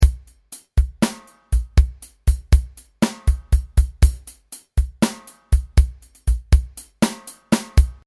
Drum Sample

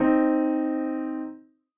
minor third

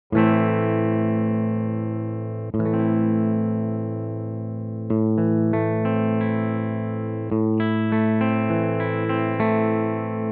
Broken Chords; guitarist

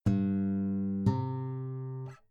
perfect fourth

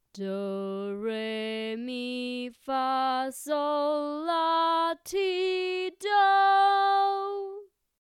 solfa scale

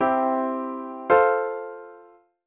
Major Chords

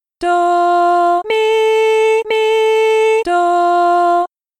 Easy Melody Solfa; Easy Melody 2

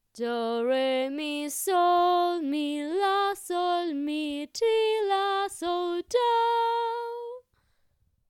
solfa melody

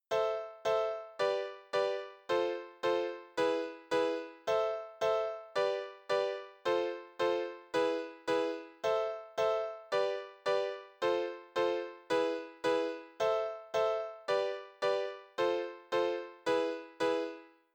Rhythm Exercise Chords

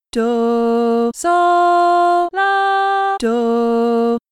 medium melody solfa

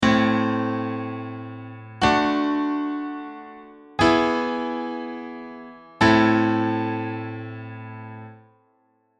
i IV V i progression in A minor again

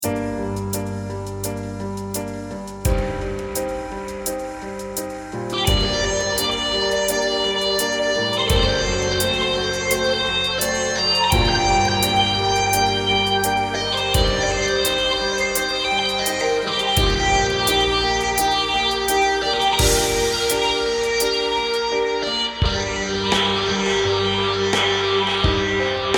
rhythm solo exercise

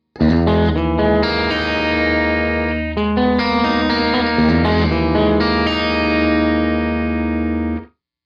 vox ac30 top boost chimey and bright

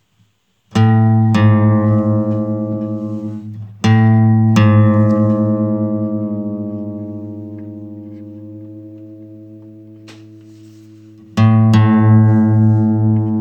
A string

